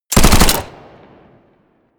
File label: smg uzi 45 modern burst